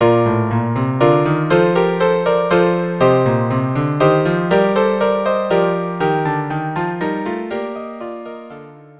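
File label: Rondeau string quartet; Fairy Queen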